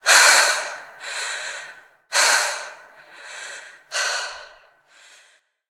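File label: Prisoner Female Catch Breath; Prison Architect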